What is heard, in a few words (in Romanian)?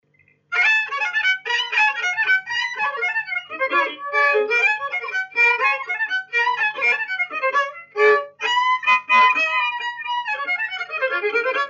Hora n doua parti vioara; Vaslui